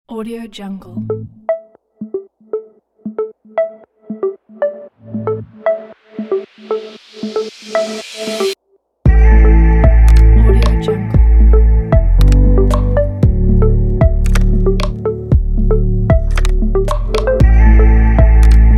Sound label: digital technology abstract; musiclabproduction